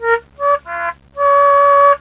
musical horn; Motorola MPX200

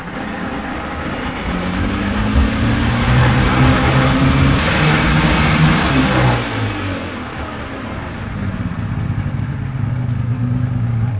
motorboat; Motorola MPX200

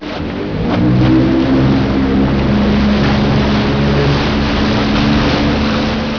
outboard; Motorola MPX200

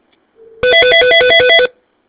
ring ring; Motorola MPX200

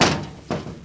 screen door close; Motorola MPX200

